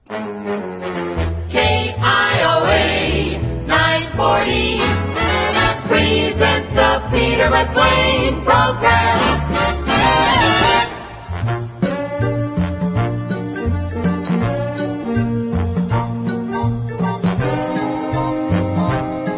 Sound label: jingle; kioa; pams